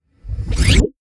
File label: sweep